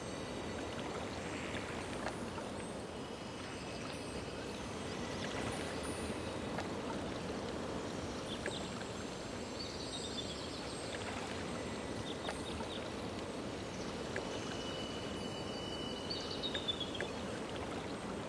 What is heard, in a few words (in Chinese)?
光明精灵森林, 环境音效